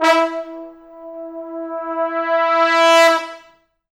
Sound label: E3, Pop Brass; Best Service ProSamples vol.19; AKAI